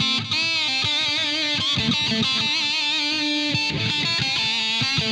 Lead Line, Electric Leads, Guitars; ACID MUSIC

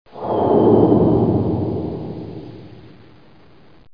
passing

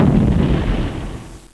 Bomb Explosion; Monkey Shines 1.2.1

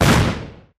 Board Click Bomb; Pirates Of Treasure Island

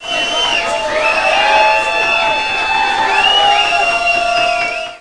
CHEERING; How Would You Survive (1995); Grolier

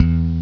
Slap Bass 2 (C1); SoundMusicSys; Simple MIDI Player

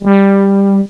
brass horn; Sound Effects for Multimedia - Volume 1; horn instruments

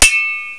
Weapon; KeepoftheDemonLord

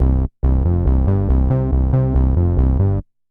Bass-D; Techno; TechnoMusicMaker

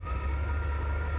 Flaps; PC Gamer IT CD 47; USAF; USAFDEMO

